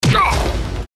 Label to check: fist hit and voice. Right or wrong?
Right